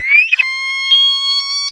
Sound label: he attack, houndeye